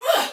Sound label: Pain, Soldier Female, Battle; Sinecure